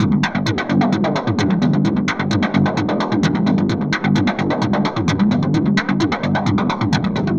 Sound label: Processed Perc